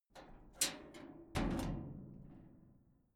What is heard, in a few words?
metal gate latch